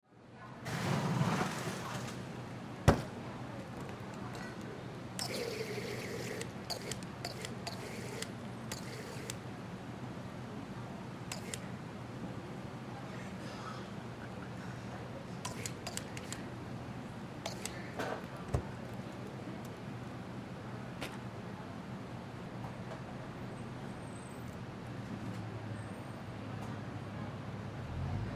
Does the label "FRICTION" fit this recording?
No